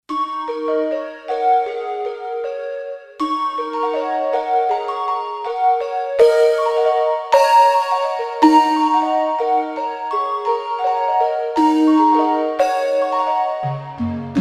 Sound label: Air Bell; Roland SC-880; Factory Patches